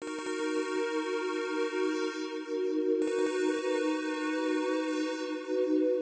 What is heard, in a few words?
Stabz, FX